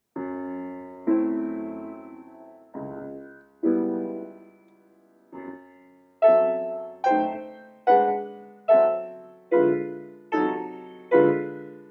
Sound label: improv, piano improvs; Satie, XV½; Erik Satie; Noam D. Elkies piano improvisations